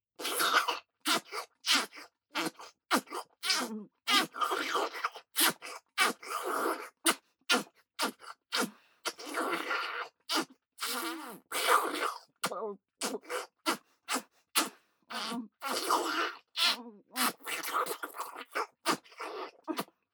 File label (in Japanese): フェラ音・強め